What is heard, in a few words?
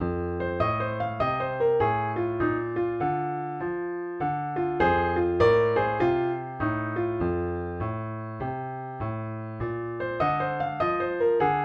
triad blues with chords F licks; piano